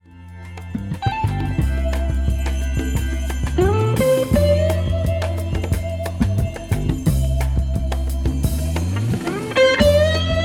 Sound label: leslie